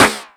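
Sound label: 127 drum 2 L, Fresh Disco House; USB Soundscan vol.20; AKAI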